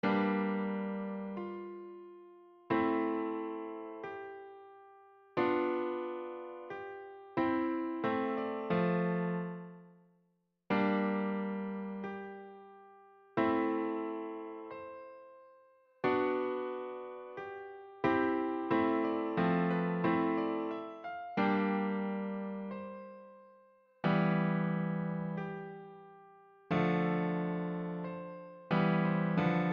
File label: Funny Melody